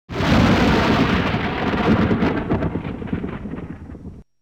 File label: Big thunder